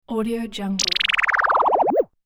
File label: descending warble gliss